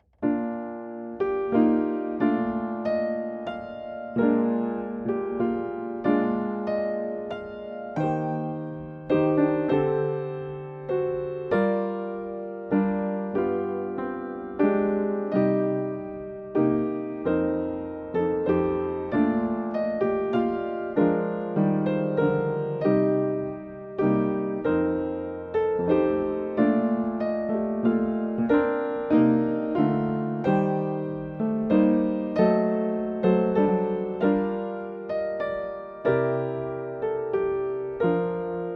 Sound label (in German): Stille Nacht Accompaniment Descant